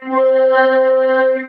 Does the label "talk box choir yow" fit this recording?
Yes